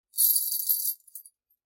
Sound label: Chain Foley; SoundsCrate